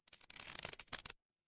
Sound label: glass crack